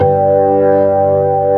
B3 HAMM G1, organ; AKAI S-Series CD-ROM Sound Library VOL-2

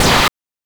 BANK2 SFX, NES DPCM; MIDINES

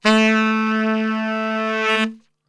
A 2 SAX, Brass Super Section; Best Service

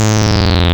Saw Sync, wavetables; Animoog

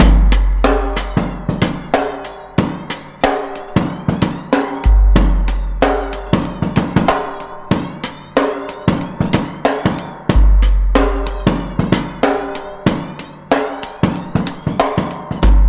99 bpm, Breaks; ONE HAND; 90 s Sample CDs; Planet of the Breaks

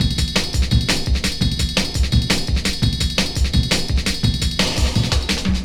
Break, Drum & Bass, Beats & Breaks

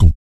VOC PERC, Vocal Planet Jazz FX BASS PERC

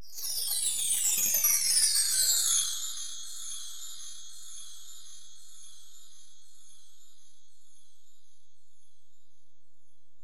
Ac Perc, Drum Machines Alesis DM-PRO

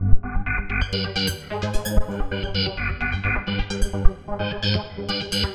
Synth, Drum n Bass; KJ Sawka - Drum n Bass & Beyond; Synth & Melody

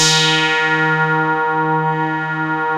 PPG D#3; Hollywood Edge - Giorgio Moroder Rare Synthesizer Collection; PPG.2.3

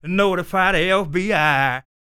SING, Blues Soul; Spectrasonics Vocal Planet

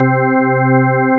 GROOVY C4, GROOVY ORGAN

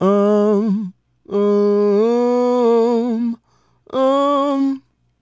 Male Solo, Hip-Hop and RnB Vocals

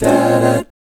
BBMI7, GUY CHORDS, Vocal, Jazz; ILIO; Vocal Planet VOL-3; Jazz & FX

